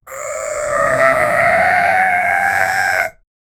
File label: high pitched growls from, goblin